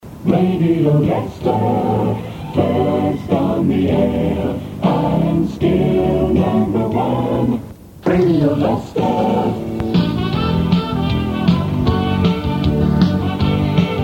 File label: radio sung jingles; Radio Leicester